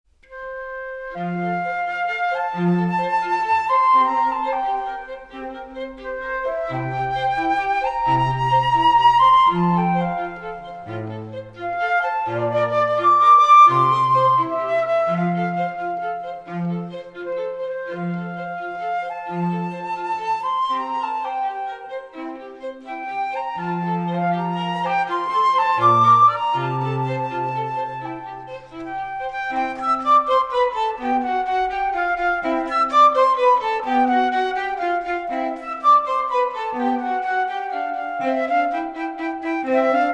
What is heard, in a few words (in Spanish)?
Divertimento Nro. 3 en Do M Poco adagio